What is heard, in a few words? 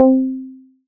PLK C4, Yamaha TX81Z synth keys